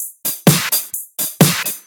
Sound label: House loops 128BPM; Vengeance Samples